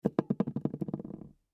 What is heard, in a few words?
Hairspray Can Set Down Wobble; Hairspray Can Set Down Wobble Wood Hair Salon Barber Household; Primping; Foley; Blastwave